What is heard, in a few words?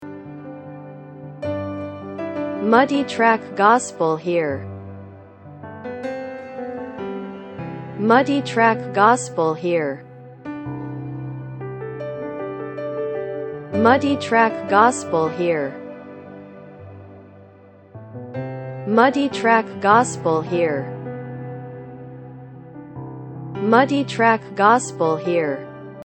Keys Piano